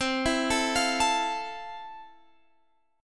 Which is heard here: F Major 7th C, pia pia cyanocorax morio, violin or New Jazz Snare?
F Major 7th C